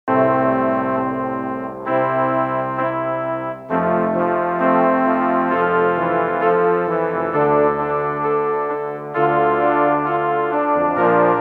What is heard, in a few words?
Cheesy Trombones; Proteus 2